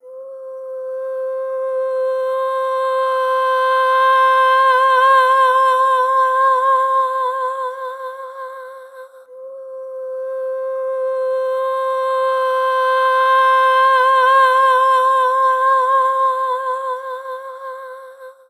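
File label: Real Bloom, lyrical and specialized vocal effects, a single note; vocal samples; Void & Vista; Kontakt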